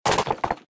Break; Aporkalypse Pigs of Doom

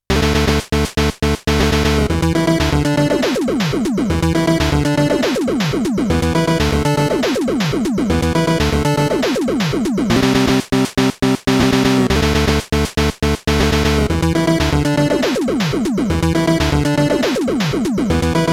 Get Weapon

